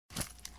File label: INVENTORY PUT IN